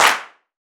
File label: Gospel Clap; Roland BK-5; Claps & Snaps